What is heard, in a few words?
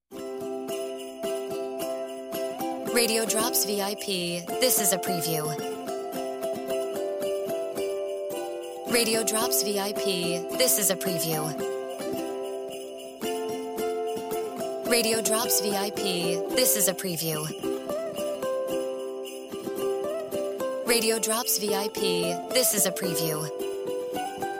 Music Bed